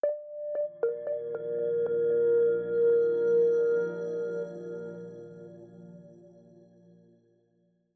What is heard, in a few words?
sonic chapter synth short; publiccodepodcast